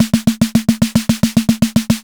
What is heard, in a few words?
snare, raw